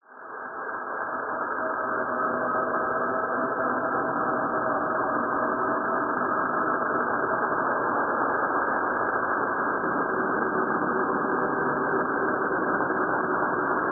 dark world operating table drone mood atmosphere; Alexander Gastrell

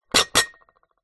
household stacked crockery down on surface

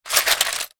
foley shells handful pick up; zapsplat

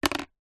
household plastic cup disposable down on surface

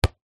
sport soccer ball bounce hard ground

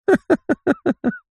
human male middle aged laugh short; zapsplat

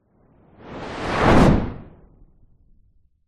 whoosh fast; sound design

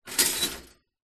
household toaster push down

